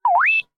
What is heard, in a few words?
Whistle; jibo-cli; behavior-template